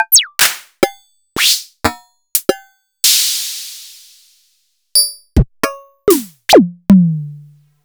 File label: Sony DPS-F7 Kit